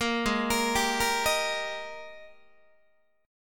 Ab Major 7th Suspended 2nd Ab Guitar 4A,4E,5A,5D,5G sharp,6C sharp; AbM7sus2; 13,16,13,17,14,14; arpeggiated; chordshape; JGuitar